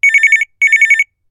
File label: Desk Phone, ringtones; Itel itelOS 15